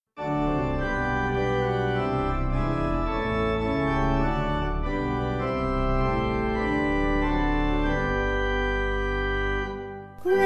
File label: Grace Tis A Charm Vocal Organ